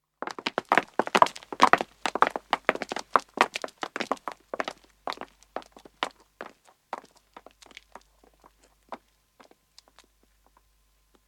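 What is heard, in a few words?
Footstep pavement walk, 2 men 2 women depart; Part of Footsteps (Sound Effects:Human); BBC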